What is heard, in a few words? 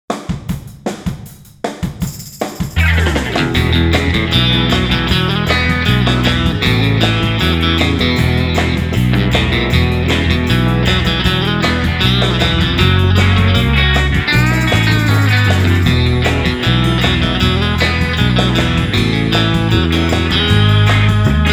Happy Go Lucky guitar